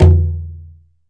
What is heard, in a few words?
ROLAND SPD-20 PERC TABLA